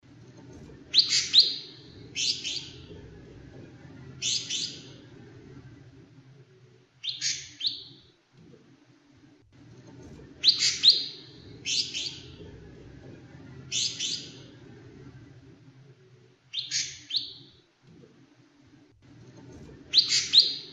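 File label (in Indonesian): panggilan ijo mini betina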